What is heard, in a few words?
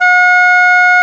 English Horn A3